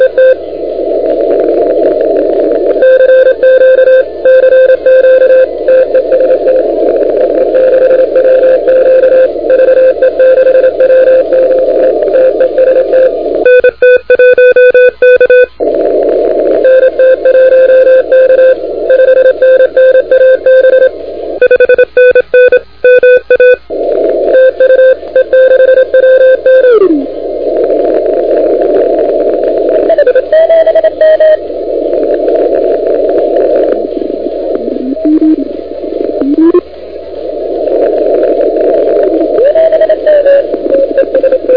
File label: CW 28MHz; ARRL10M